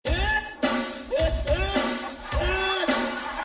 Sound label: nerds laugh